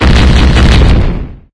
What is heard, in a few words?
Explosion; MapleShrine; mkxp; gameasync